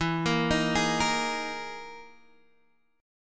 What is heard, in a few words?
E Major 7th Flat 5th, Guitar, Standard; EM7b5 arpeggiated; x,7,8,8,9,6; JGuitar